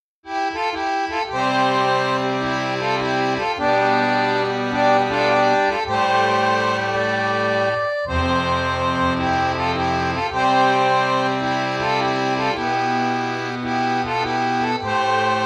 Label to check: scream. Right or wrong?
Wrong